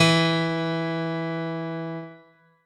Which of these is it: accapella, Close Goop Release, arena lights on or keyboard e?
keyboard e